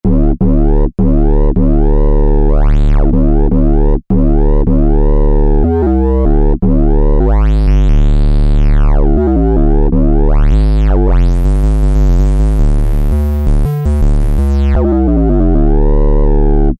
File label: Drive Bass, Korg microKORG; Factory Voices